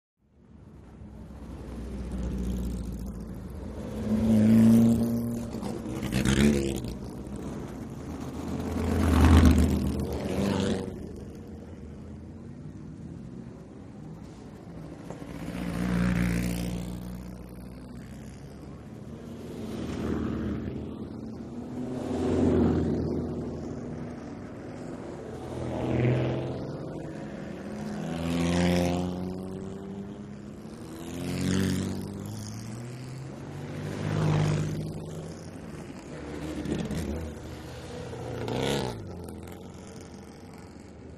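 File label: Vintage Race Car; Racing Ambience, Vintage Race Cars Cu Cars Driving Slowly Away R / L From The Pits Onto The Track, Pa In The Background, Shot Cu From The Pits; 1954-1962 Vintage Race Cars Cu Cars Driving Slowly Away R / L From The Pits Onto The Track, Pa In The Background, Shot Cu From The Pits.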